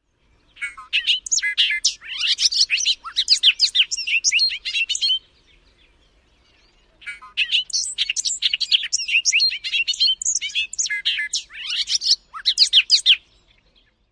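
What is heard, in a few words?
Bobolink song; NY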